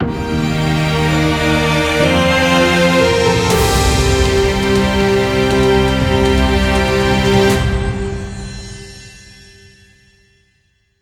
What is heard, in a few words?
music success; highrise heroes word challenge